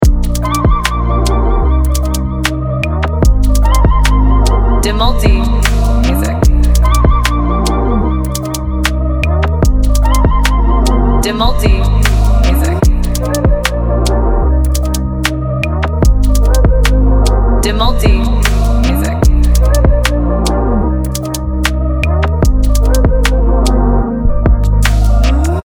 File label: instrumental edm; Ada sisi emosional di balik ritme EDM pada track ini. Meski instrumental, musiknya terasa seperti percakapan intim